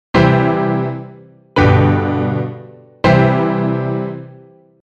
JINGLE make a bow

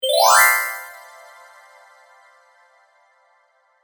kirarin

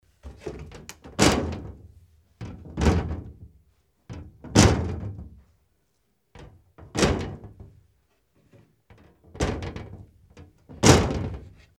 Glass wood

